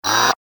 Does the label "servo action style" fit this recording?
Yes